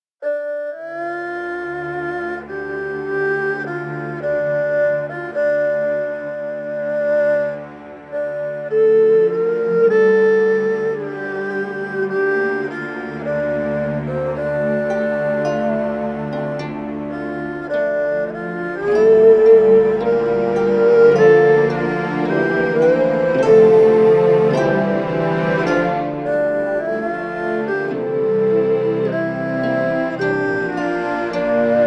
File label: Erhu Ballad Intro; Oriental Empires